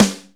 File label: COMBAT SNARE, Hip Hop Kit; E-MU Formula 4000 Series Vol. 1; Hip Hop Nation